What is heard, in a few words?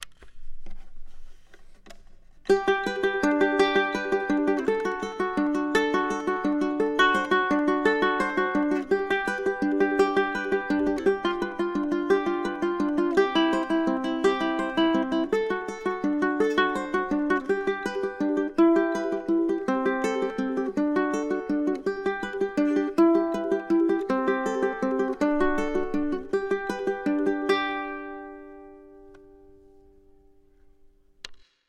prelude d major; matteo carcassi